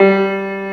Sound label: PIANO G2